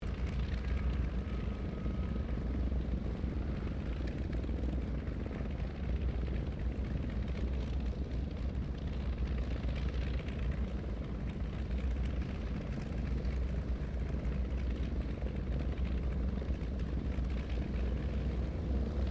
AIRCRAFT LOCKHEED L-1049 SUPER CONSELLATION SHUTDOWN LEFT DISTANT; Connie, Super Constellation; 1955; Airplanes